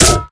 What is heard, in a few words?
blade hit robo; source engine; tf; mvm; melee impacts; video games